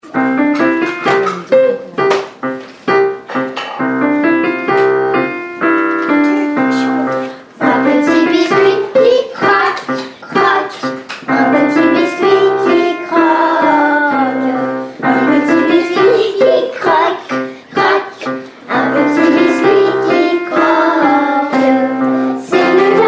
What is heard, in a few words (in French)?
Chorale; Un petit biscuit